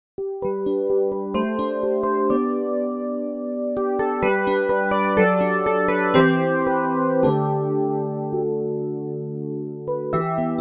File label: FM EP; K-Sounds